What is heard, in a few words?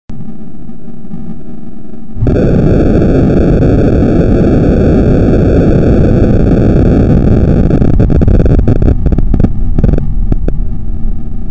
quake